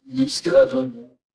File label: feedback success